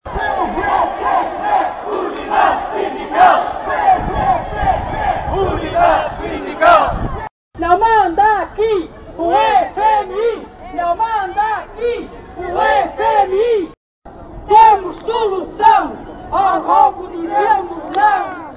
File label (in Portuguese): gritos